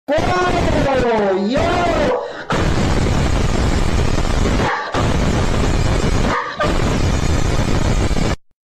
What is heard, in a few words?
woooah yoo aahhhhhhh